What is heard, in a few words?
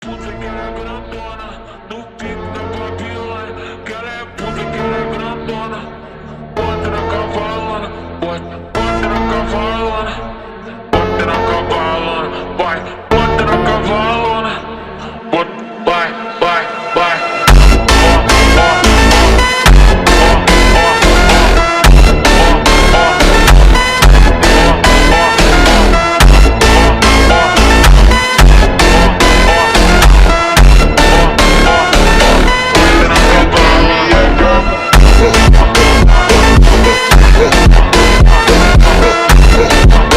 funk slowed phonk